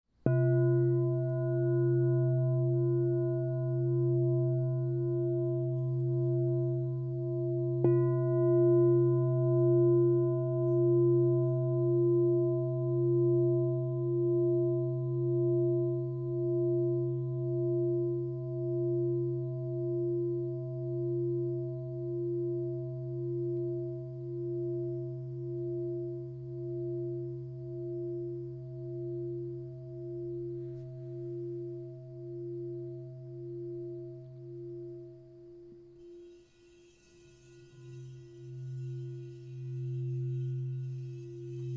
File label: Crystal Tones Singing Bowls